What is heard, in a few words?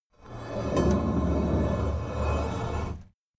metal heavy scrape